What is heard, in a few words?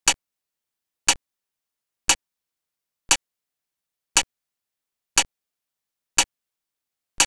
Locator tone